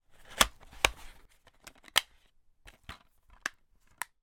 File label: household dvd case open disc put in case close